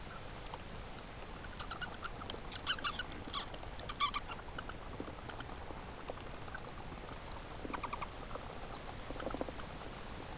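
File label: in a cave with bats; v peshere s letuchimi mishami; zvuki prirodi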